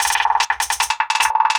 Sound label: LOOP, 150 BPM